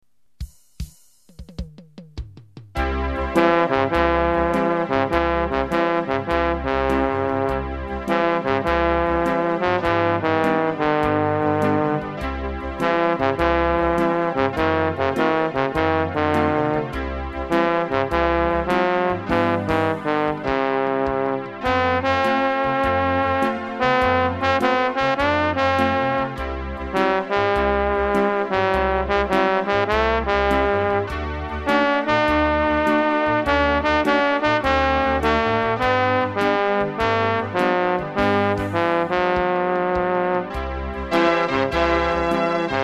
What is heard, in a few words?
Organ Music; FX-20